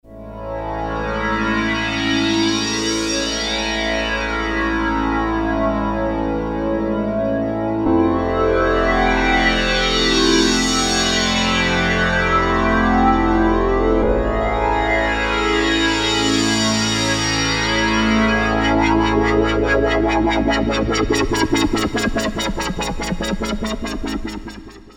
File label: Sweeper, Korg DSS-1 DWGS synthesizer presets; Korg DSS-1 Sound Library